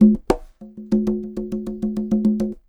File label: Conga Loop (100BPM); musicradar